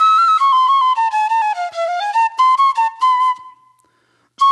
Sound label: Eastern Flute